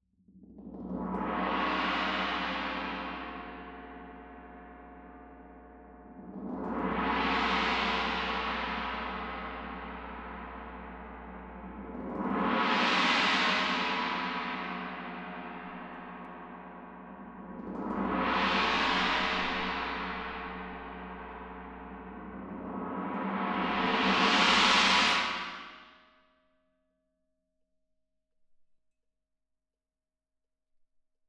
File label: Tam-Tam